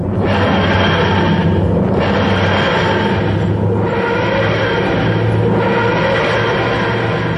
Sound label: Lock Chime; Tardis